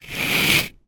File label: nature ice block slide on ice; zapsplat